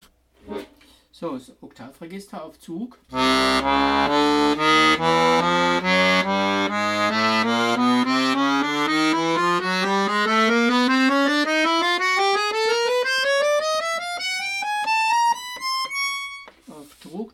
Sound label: Oktav Tremolo; QUAGLIARDI